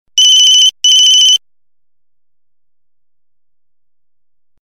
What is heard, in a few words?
Siemens; Bi Temps